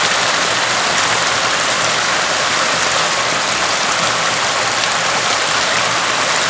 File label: waterfall flow close; Call of Duty Modern Warfare